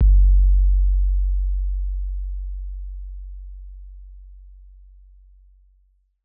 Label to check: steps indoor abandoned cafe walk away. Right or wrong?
Wrong